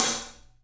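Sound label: SABIAN HAT, left; 3D Sound; 3D Drums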